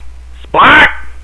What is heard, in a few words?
headshot; czero